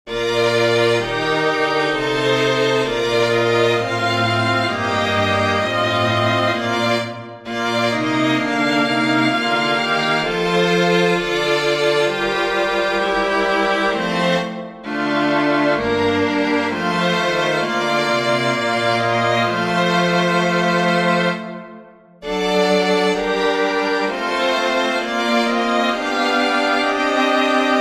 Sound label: chorale for string quartet; bach johann sebastian; sei nun seele deine